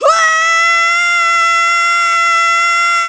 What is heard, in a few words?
Big Spin; Link performing the Big Spin attack (edited to the correct length and fade-out); Zelda